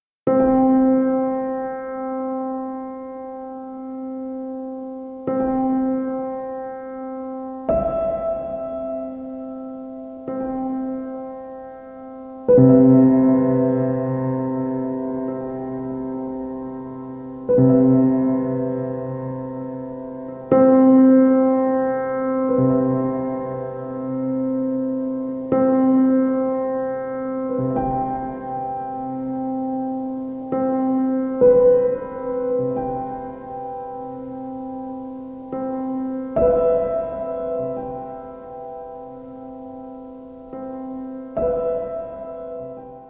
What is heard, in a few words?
Piano Sounds